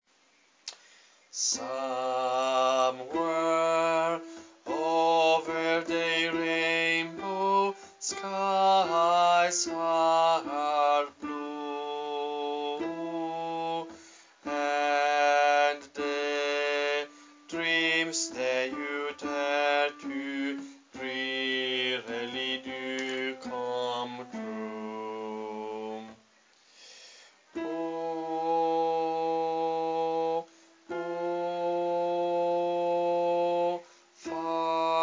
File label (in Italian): contralti